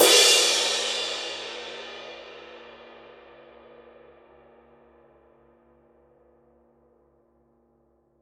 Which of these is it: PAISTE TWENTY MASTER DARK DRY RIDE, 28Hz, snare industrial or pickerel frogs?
PAISTE TWENTY MASTER DARK DRY RIDE